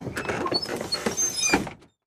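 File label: school bus door open; brakes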